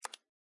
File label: click